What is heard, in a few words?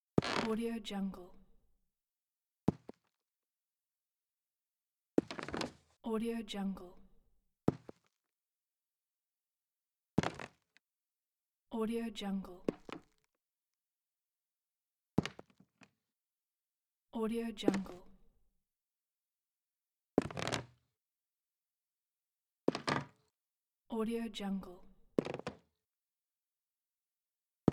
walk gingerly with dress shoes on wooden floor